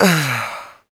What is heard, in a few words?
FEM PERC, Vocal Jazz FX; Spectrasonics Vocal Planet